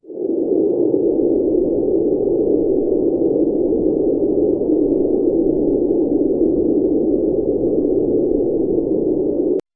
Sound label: silent cold high altitude; volcanic